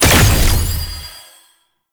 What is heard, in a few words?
Tenno Revive Success; Warframe